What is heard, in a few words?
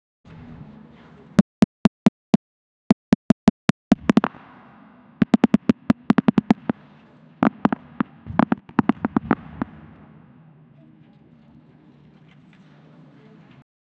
cinematic bouncing echo s